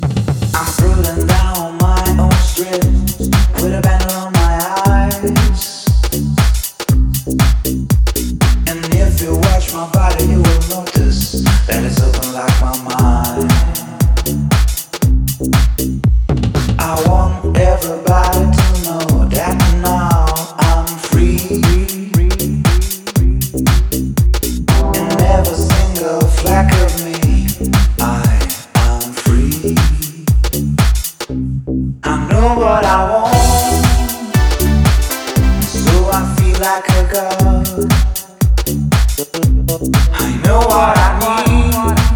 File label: Deep House